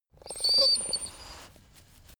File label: dog teeth chatter squeak; natur-tiere; hunde